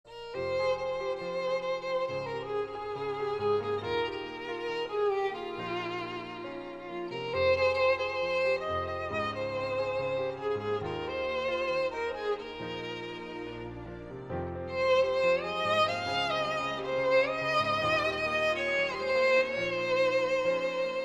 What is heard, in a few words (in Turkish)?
keman zil sesi; cep telefonuna keman sesleri